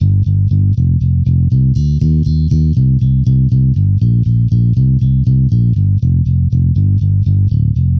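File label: Bass 1 Rock G minor 120bpm; Guitar and Bass; Ableton; Factory Packs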